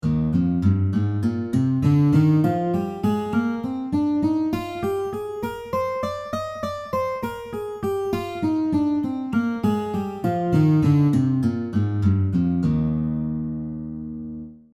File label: C Major C2